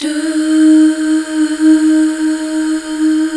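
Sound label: Duh Female; Best Service Gigapack 1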